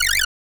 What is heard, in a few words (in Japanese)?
pyoruru